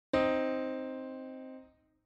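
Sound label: augmented second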